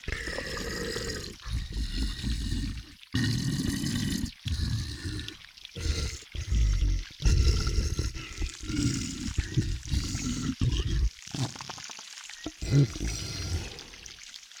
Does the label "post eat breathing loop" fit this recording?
Yes